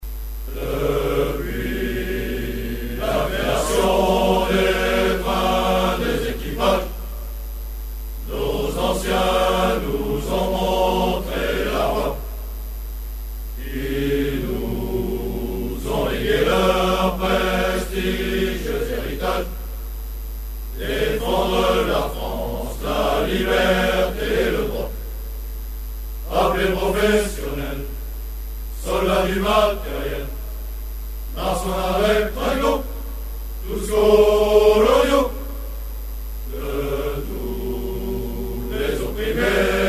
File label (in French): Chant; Chant du 9e RCS